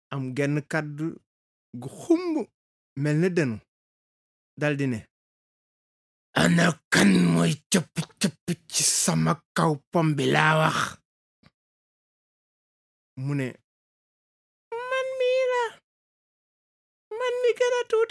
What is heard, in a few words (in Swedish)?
bip